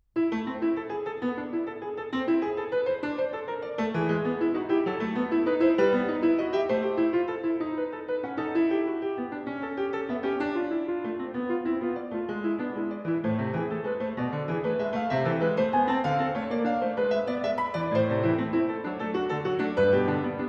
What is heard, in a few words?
Gigue; Partita no. 3 in A minor, BWV 827